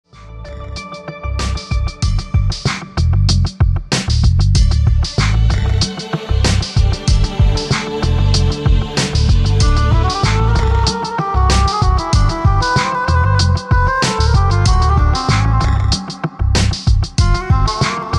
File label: Sand bubbles